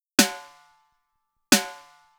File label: Snare, Snare Patterns; Beat Components